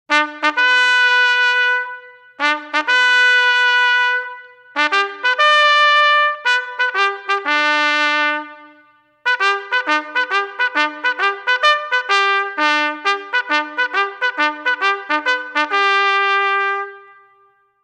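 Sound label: fire call, Ceremonial Music; Marine Corps Birthday Ball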